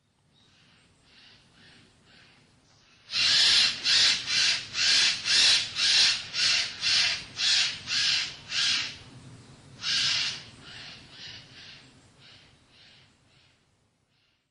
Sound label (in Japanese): rurikakesu; amami